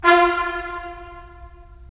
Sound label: BRS, Tps Stacc; Roland - LA Composer vol. 4